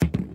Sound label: golf hit; toystory; rex